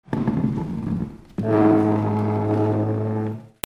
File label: FRICTION